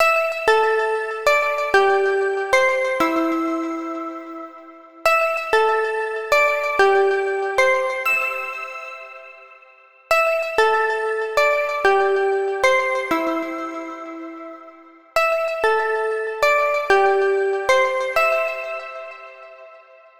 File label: Harp Melody; super crush k.o.